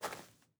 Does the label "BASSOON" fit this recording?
No